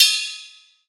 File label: Ride, Cymbals; drumkits; fl studio; bvker drumkit